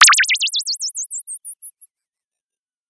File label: Sound of a bird tweeting nicely